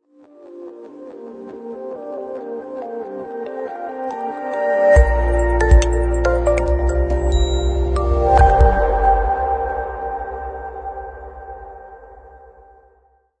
Floating Particles Logo

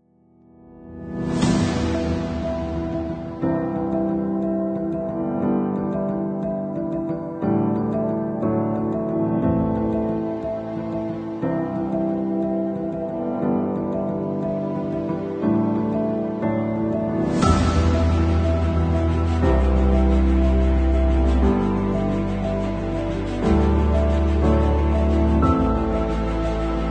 Epic with Orchestra Choir

